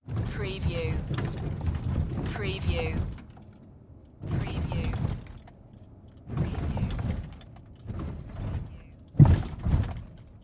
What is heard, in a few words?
Auto Drive Interior; sound effects bible